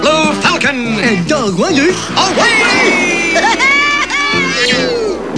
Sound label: battlecry